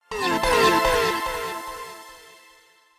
phone outgoing busy

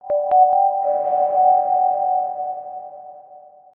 device removed

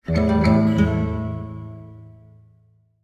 guitar

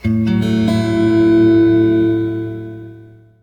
message

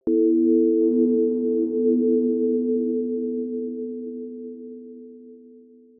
window question

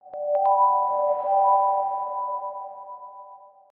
device added

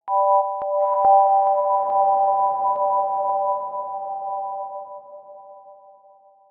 network connectivity lost

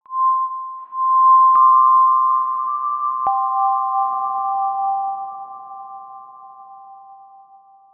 desktop login

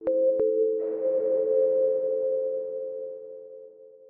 screen capture

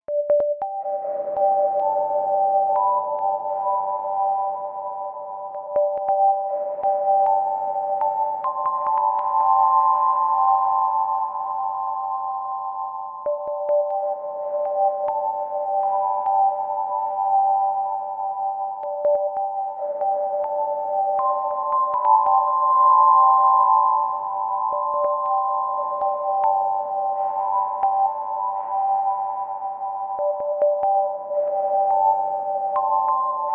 phone outgoing calling

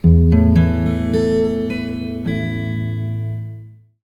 complete, guitar

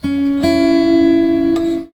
audio volume change

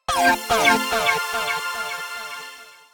phone incoming call short

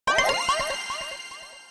message

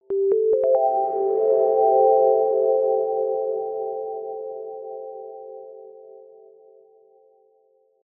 trash empty

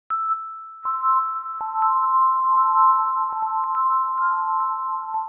bell

